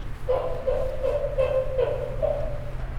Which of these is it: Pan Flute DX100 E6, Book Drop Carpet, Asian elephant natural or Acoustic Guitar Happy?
Asian elephant natural